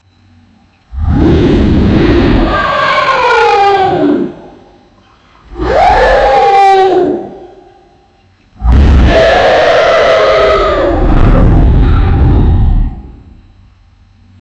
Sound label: the roar of a dragon; disasters